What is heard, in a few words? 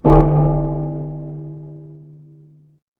instrument bell